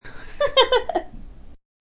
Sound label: Young Lady Laugh, Quick happy laugh from a young lady.; Laughter; Voice